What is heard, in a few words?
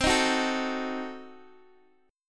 C Diminished 7th, Guitar DADGAD, chord